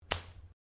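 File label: sounds of a high five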